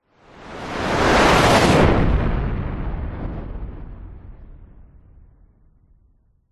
vehicles fighter jet pass by zoom past very fast designed